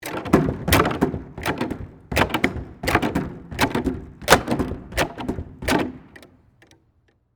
Gymnastics Jump, Sports, Gymnastics, Spring, Board, Jump; Several Times; Miscellaneous Sport Sound Effects; BlastWave